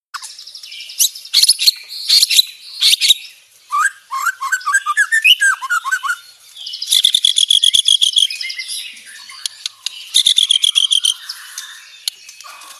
Suara Murai Lampung

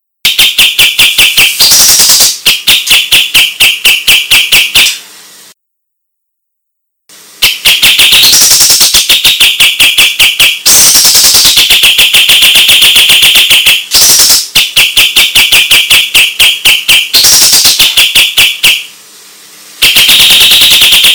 suara kapas tembak betina

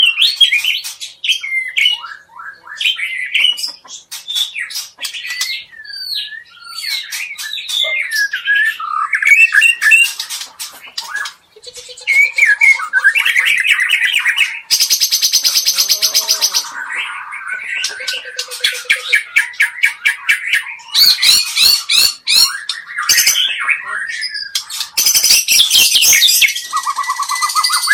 Suara Murai Medan Bahorok

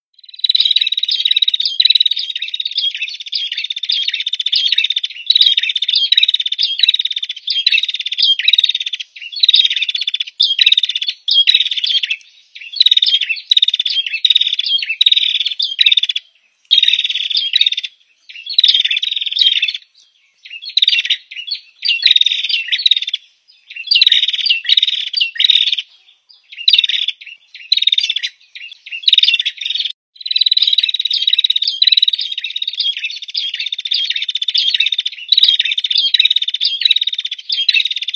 suara burung prenjak betina pikat

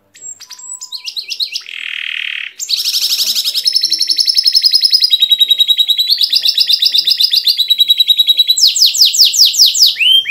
suara kenari juara

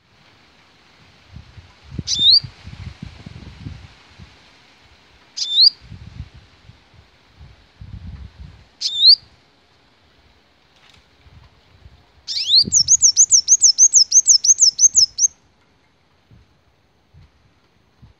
suara pikat burung sepah raja ribut

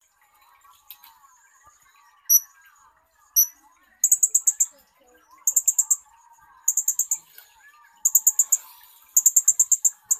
suara serindit jawa

